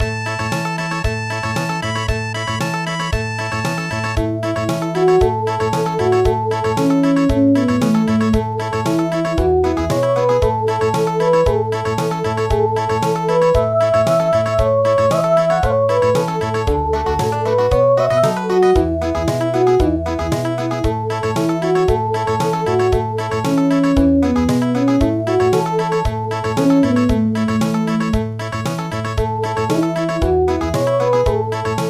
Country, midi

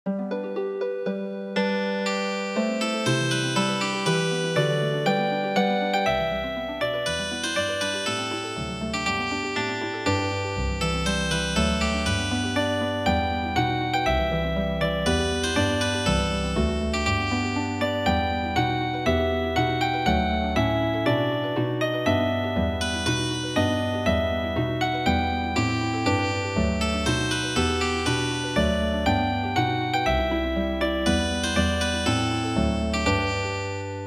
Healing Harp

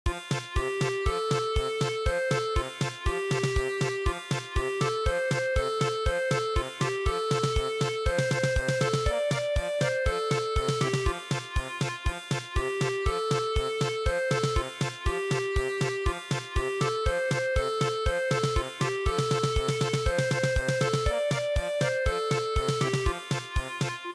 German Polka

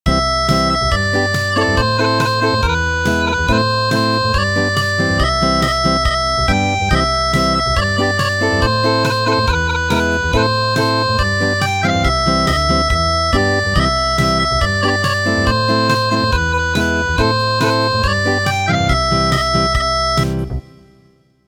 Acoustic

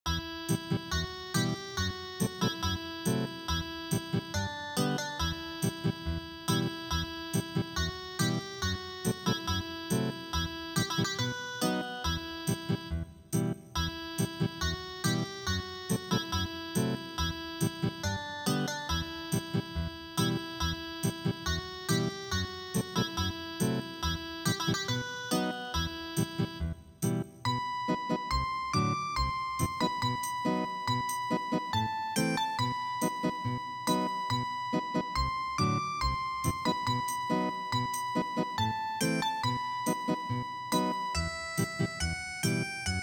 Ethnic Guitar

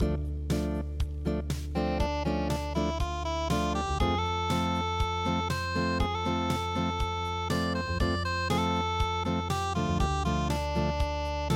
unpluged

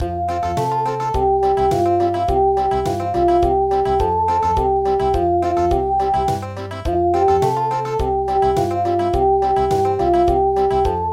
ochao nomini country

